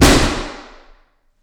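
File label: Lunch Room Center; Reverb; 29x37x12ft; 30ft; FL Studio 9; Fruity; Edison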